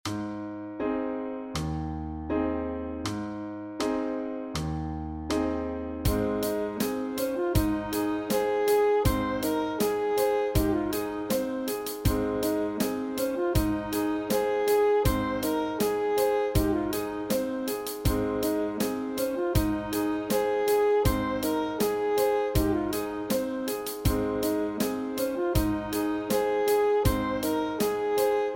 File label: Sax Study